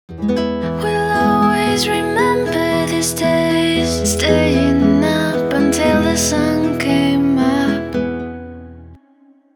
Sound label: Vocal and Guitars (before Little EQ)